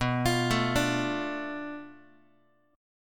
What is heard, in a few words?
B Minor 7th Flat 5th B Guitar; Bm7b5; arpeggiated; x,7,8,7,6,x; JGuitar